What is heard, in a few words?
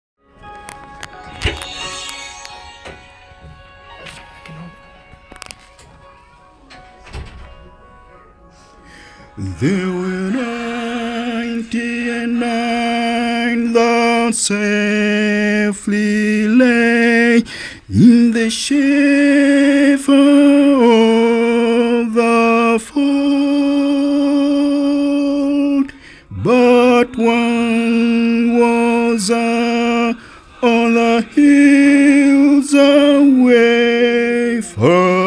Tenor